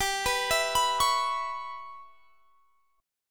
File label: G 6th Flat 5th; G6b5 arpeggiated